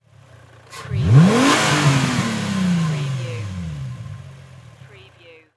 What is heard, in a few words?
INFINITY G35 ENGINE SINGLE REV